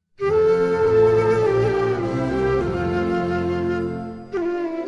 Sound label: anthem; srilanka